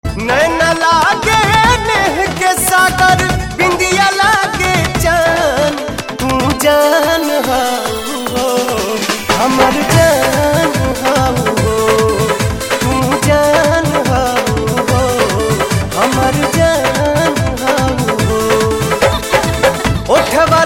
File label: BHOJPURI RINGTONE